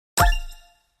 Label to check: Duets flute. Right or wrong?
Wrong